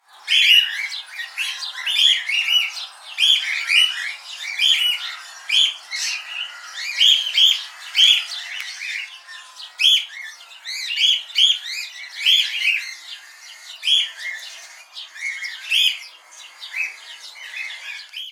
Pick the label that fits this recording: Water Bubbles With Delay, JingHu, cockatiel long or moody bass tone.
cockatiel long